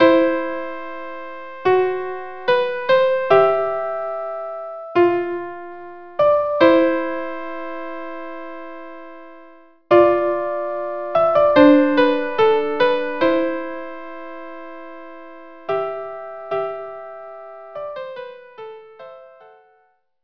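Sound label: unaccompanied violin; Ave Maria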